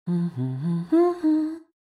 Humming; Link; The Legend of Zelda Tears of the Kingdom

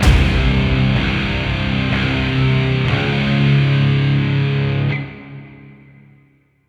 BGM Jingle Airship; mario wonder

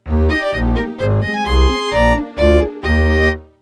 jingle positive; 3ds; Tomodachi Life

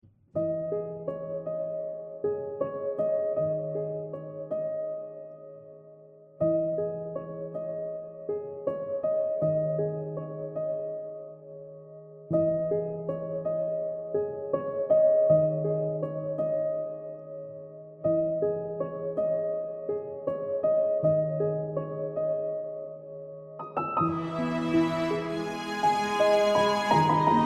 Piano & Strings Cover

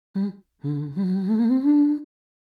Humming

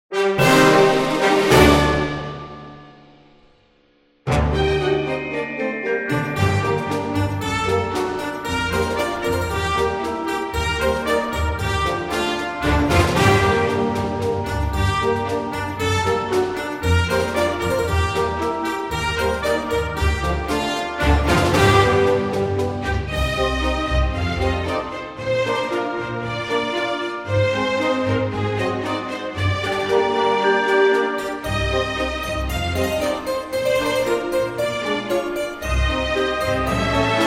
Orchestral Arrangement; Phantom Hourglass

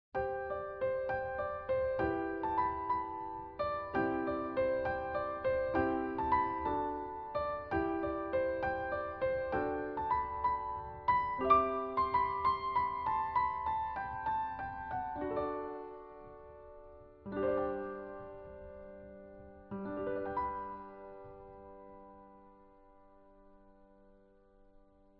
Piano Solo; Octopath Traveler 2

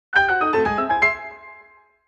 Puzzle Solved Jingle; The Legend of Zelda Tears of the Kingdom

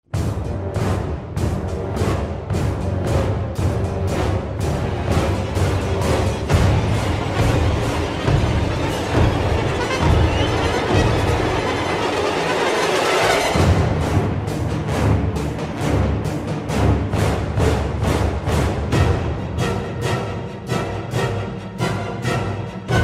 combat music; Nurgle

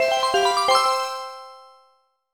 Jingle; Super Mario 64 DS

